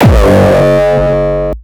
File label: Nu Style Kick, Kickdrums